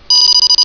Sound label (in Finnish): gsm halytys